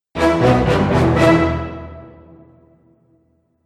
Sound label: Action Film Dramatic Event, musical; Musical Sound Effects